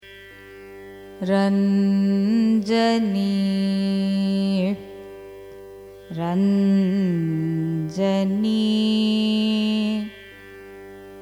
raga ranjani signature, carnatic music

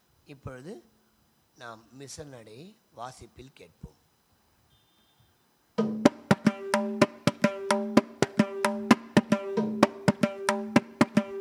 tarang mishra nadai; carnatic music

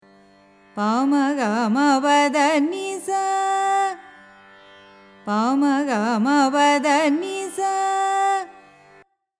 simhendramadhyamam, carnatic music

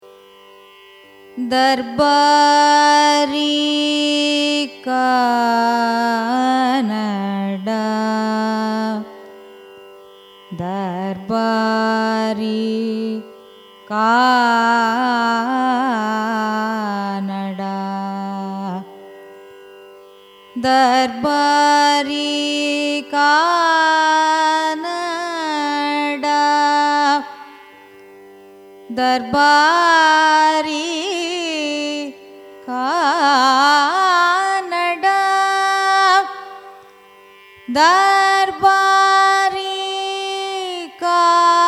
raga darbari kanada signature; carnatic music

